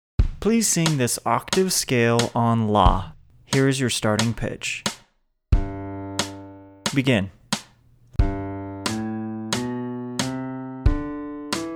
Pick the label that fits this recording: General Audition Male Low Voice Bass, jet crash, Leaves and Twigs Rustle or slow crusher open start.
General Audition Male Low Voice Bass